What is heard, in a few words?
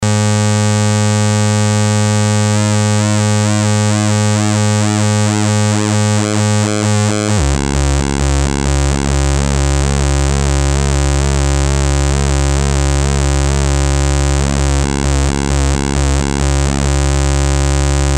261e PWM; Buchla